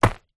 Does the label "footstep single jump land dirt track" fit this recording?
Yes